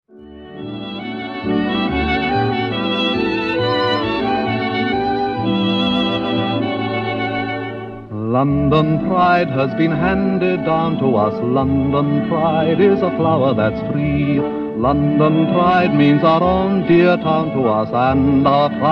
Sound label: Wartime